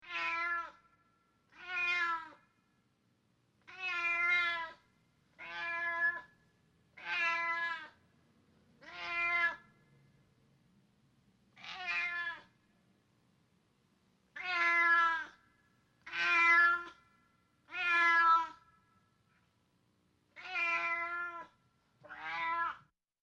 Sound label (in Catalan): gat miolant